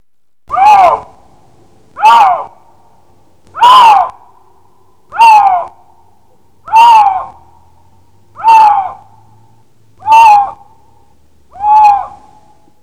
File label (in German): Luchs Ruf